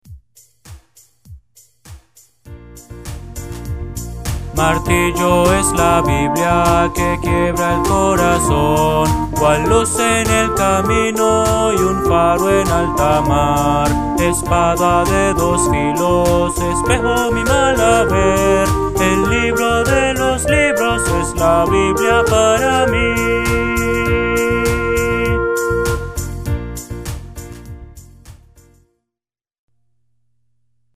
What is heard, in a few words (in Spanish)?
Martillo es la Biblia, himnos cristianos